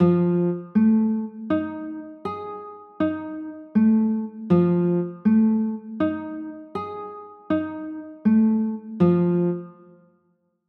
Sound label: f sharp 9; f 7 sharp 9